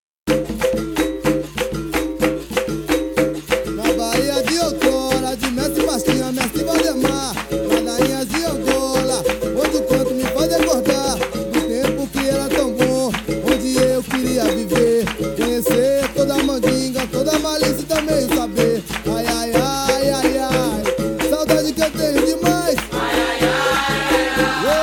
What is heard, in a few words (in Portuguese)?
Capoeira Musicas; Esportes; tempo que nao volta atras